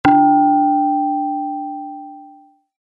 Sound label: tone